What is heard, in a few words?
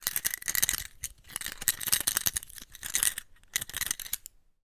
household keyring plastic movements; zapsplat